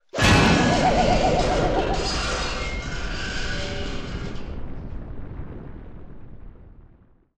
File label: Hammer, cartoon; Esther